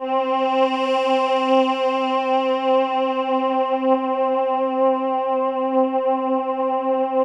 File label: VOCAL PAD, SYNTH, Trance; AMG; Global Trance Mission VOL-2